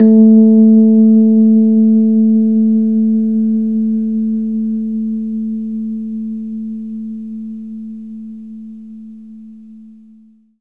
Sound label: Rhodes, Keys; 3D Sound; 3D Keys VOL-1